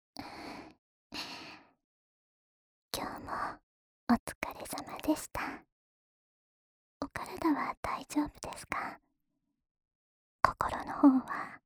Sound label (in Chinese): 吐息聲滿滿的陪睡